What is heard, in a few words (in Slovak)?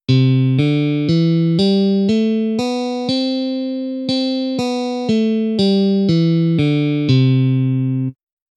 stupnice elektricka gitara hexatonika